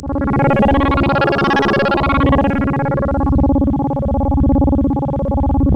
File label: C#, Synclav FM; Big Fish Audio; SPACE1